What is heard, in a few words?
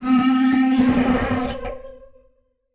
Transition Sweep Laser; Production Element